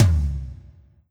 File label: Roland SC-88 Low Tom